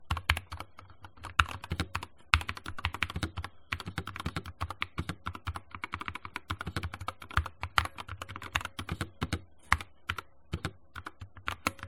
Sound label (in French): enregistrement son corsair k65 pro mini